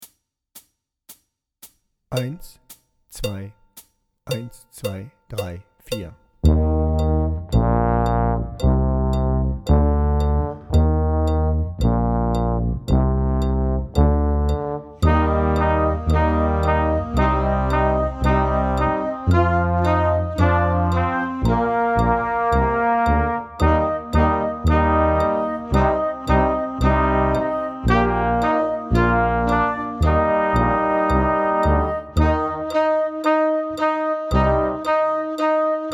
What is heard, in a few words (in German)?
March ohne Drums